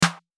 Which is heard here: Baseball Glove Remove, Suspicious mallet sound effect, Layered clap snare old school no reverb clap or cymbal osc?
Layered clap snare old school no reverb clap